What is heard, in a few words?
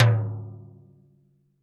TD-20 Tom A, Roland TD-20 Toms